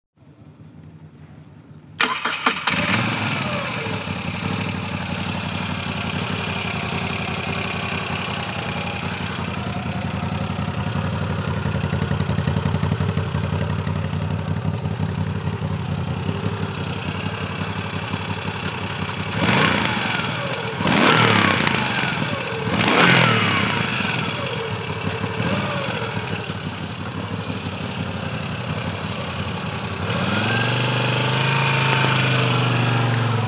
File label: Moto Guzzi V9